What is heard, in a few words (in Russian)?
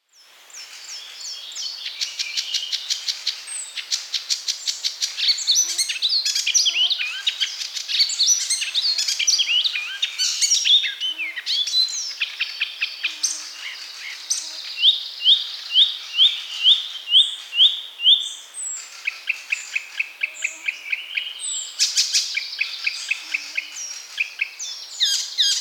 zelenaya peresmeshka golos